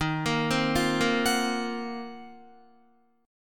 Eb Minor 6th Add 9th Eb down Guitar 4E,4A,5D,5G,5C,6F; arpeggiated